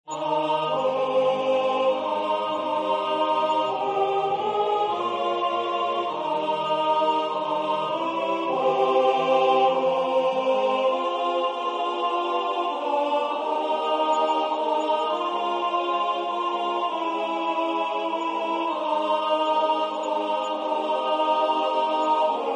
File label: Hymn alt